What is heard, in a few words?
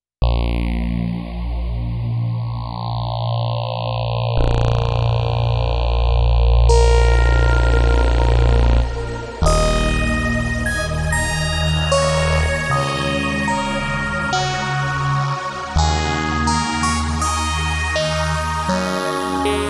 Synth Theme